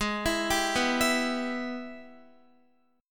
Ab Minor Major 7th Sharp 5th, down, Guitar; arpeggiated; JGuitar

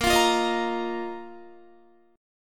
A sharp Suspended 4th Guitar; A#sus4 strummed; 17,20,17,20,17,18

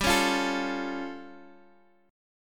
A Augmented 7th G

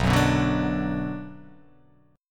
B Minor B

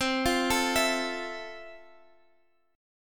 F Major 7th C, guitar chord

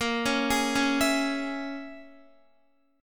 Bb Minor 7th Flat 5th, Guitar; Bbm7b5 arpeggiated

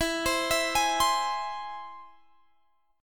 E Augmented, Guitar, Standard; E+ arpeggiated; JGuitar

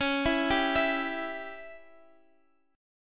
Db Diminished, Guitar Open F; arpeggiated; JGuitar

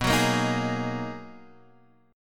B Major 13th B down Guitar Half Step Down

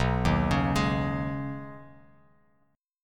B 6th Add 9th B down Bass Standard; arpeggiated

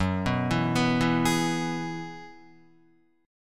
Gb 7th Suspended 2nd Suspended 4th, Guitar; arpeggiated; JGuitar

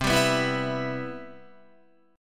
C Major 7th, Guitar

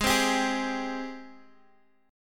Ab Major 7th Ab, Guitar Drop D; strummed; down

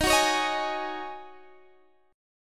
Db Suspended 2nd Suspended 4th Eb, chord

